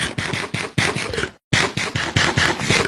eating; minecraft

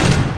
door slam; fnaf

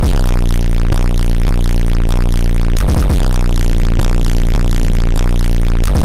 bass distortion

